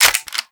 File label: UMP45 reload clip in, foley; Call of Duty Modern Warfare